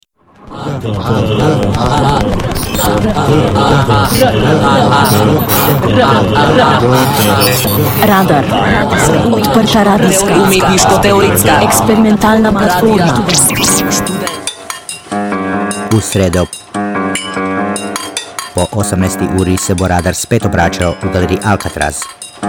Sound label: ORGAN JINGLE; RADAR